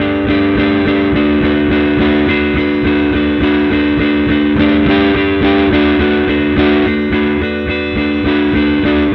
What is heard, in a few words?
Guitar, Indie Rock; Sonic Foundry (Sony Creative Software); Indie Rock Anthems